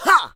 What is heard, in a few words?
move up; Tom